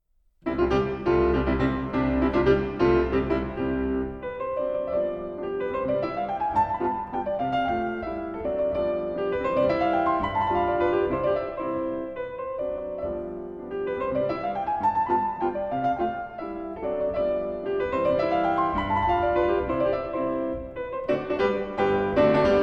Mazurka

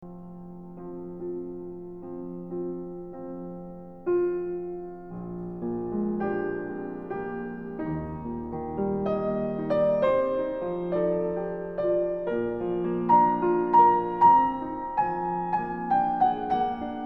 Ballade in G Minor op. 23

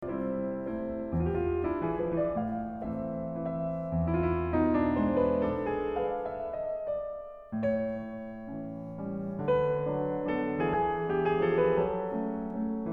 Waltz in A Minor Op. 34 No. 2

